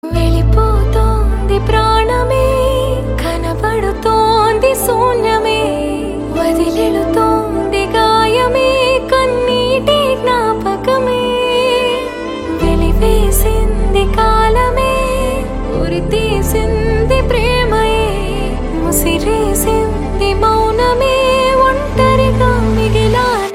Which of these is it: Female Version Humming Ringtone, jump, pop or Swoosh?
Female Version Humming Ringtone